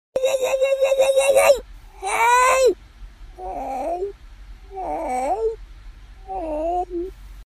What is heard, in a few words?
WOAH, reverse